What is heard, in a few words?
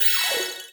Enchant